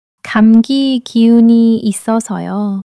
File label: struggle